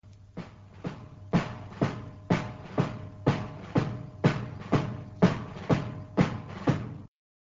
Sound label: rhythm